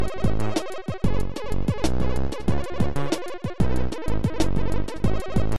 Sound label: cool music